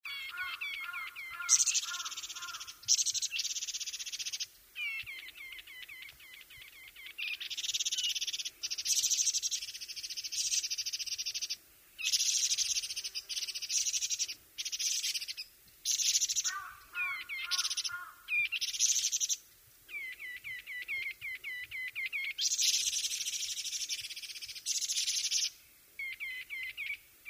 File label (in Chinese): 紋翼畫眉; 台灣斑翅眉; 畫眉科/留鳥